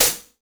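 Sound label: Lo-Bit pedal hi hat; Hi Hats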